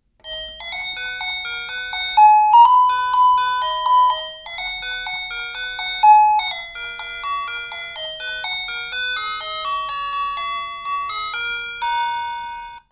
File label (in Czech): melodie